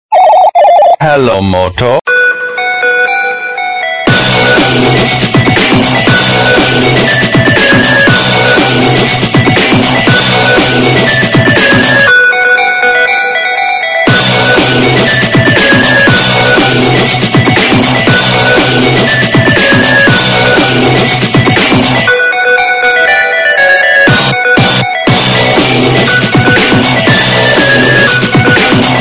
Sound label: Ringtone